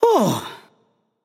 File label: nv sigh; smite; xbalanque